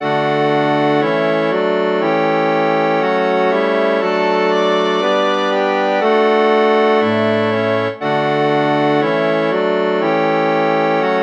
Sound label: Andante cantabile for harmonium; synth